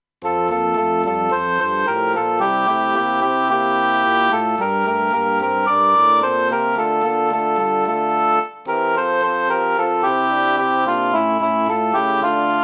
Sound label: SATB